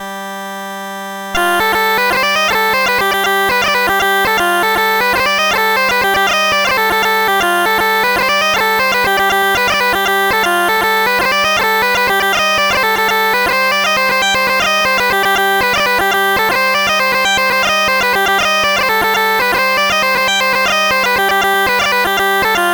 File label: jig, OctaMED